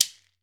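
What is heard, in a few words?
GHANA shaker, African Perc; E-MU Formula 4000 Series Vol. 4 – Earth Tones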